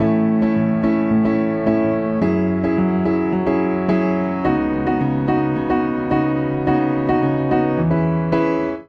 Progression I-vi9-II_iii-V6 A Major 108 bpm, Upright Piano; MIDI Clips; Recovery; Ableton; Factory Packs; Spitfire Audio